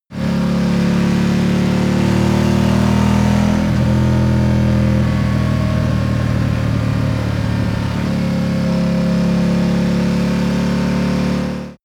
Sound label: motorcycle ducati cruiser accelerate decelerate short